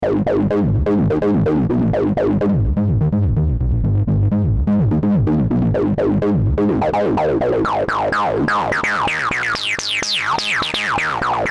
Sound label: basic acid